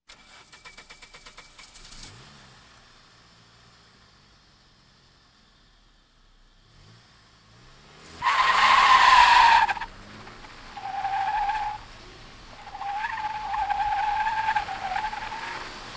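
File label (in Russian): резкий старт с визгом и дрифты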